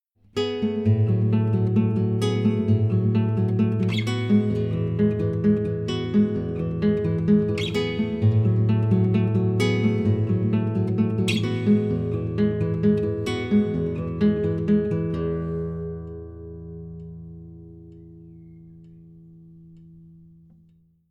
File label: Right Hand, guitar